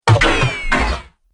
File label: Sci Fi Impact; Tech Warrior